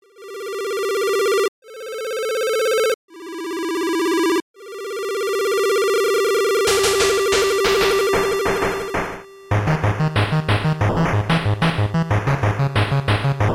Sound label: arpeggios and stuff